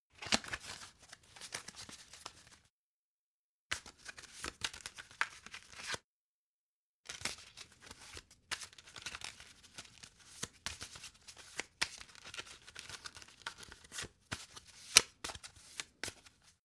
playing cards handle; comedy-cartoon; spielzeug

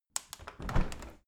window handle open soft; objekte; fenster

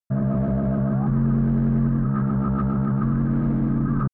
low abstract synth; energie

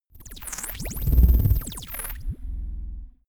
sci-fi vehicle alien chopper short; raumschiff

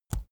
keyboard laptop enter push soft; kommunikation; computer

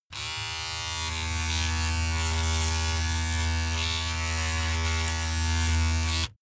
razor on load pov short

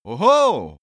male cheer mildly hoho; koerpergeraeusche; menschen; soundpool

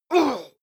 male combat hit soft; schreie; menschen; soundpool

